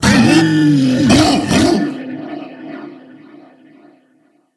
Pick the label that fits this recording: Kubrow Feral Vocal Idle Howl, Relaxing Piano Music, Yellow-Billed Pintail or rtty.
Kubrow Feral Vocal Idle Howl